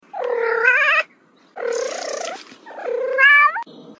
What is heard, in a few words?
cat chirruping and meowing; صدای گربه